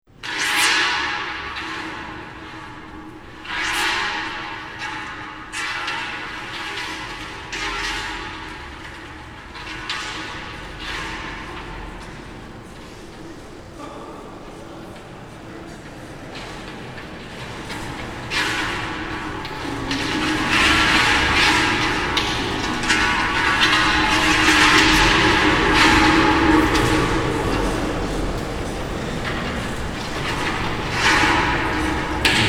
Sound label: disc cable machine, Mechanical Sound Orchestra; Heckert Matt